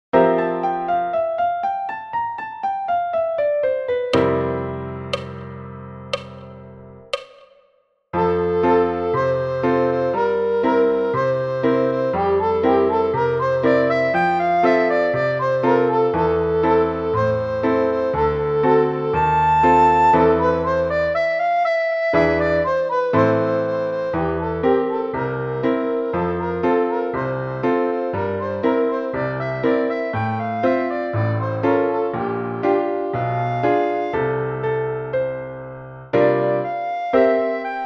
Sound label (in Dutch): klaverweide alt; Blokfluitles in Utrecht